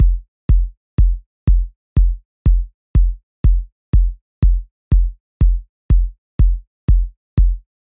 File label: 122 BPM Kicks; mmb studio soundtrack essentials